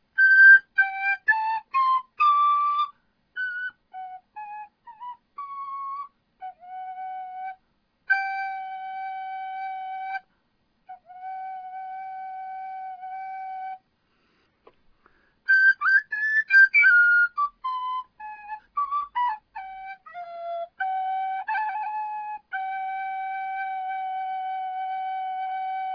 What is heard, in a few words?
recorder improv